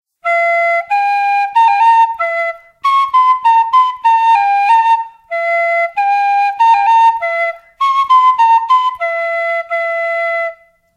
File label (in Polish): flet prosty; Nagrania Lokomotywy; edukacja wczesnoszkolna